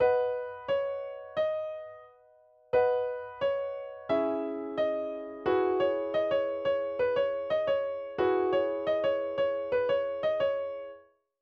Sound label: instrumental